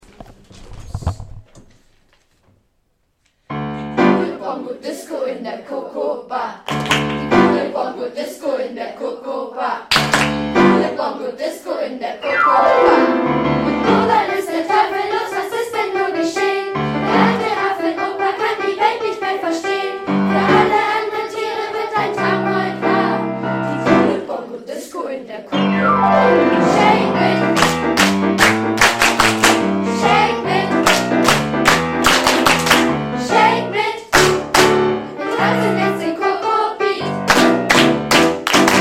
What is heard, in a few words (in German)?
Bongo Disco; Die coole Bongo Disco